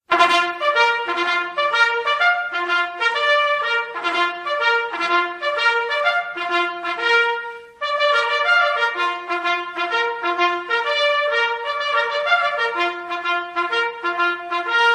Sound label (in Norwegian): Revelje ringetone